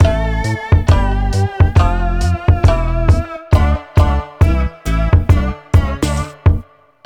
New Bpm68 I L

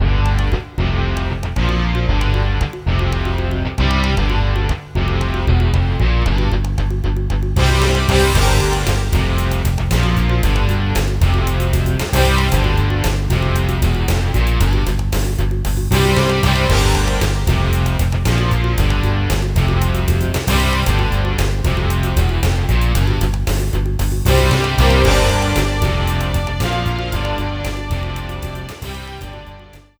High Score Table; Slam Dragon; PS1

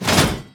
Shield Prepare shield; Pecheneg

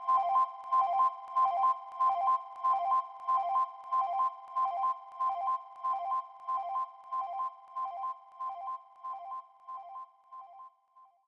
Basketball; Sfx Basketball

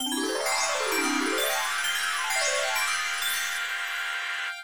Level Ending short; Yoshi's Story; Nintendo 64

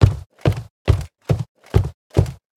Shield Sentry Walk Loop; gamerip; Ruin

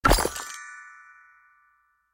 Animations Repair

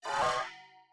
aquarium boss voice Energy Attack

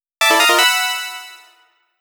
Jingle - New Record; Sphere Knight

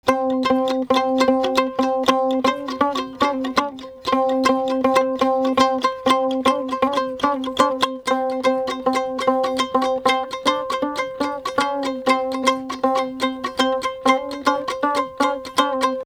32 beats

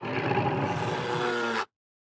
Cry

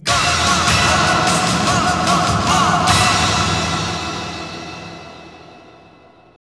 Str G#

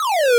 Powerup-Platformer Jump Rev

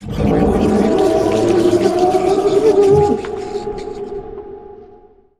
Fluke Mother Roar

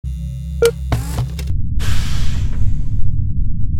Eject

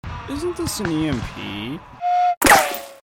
Launch platform; The Henry Stickmin Collection

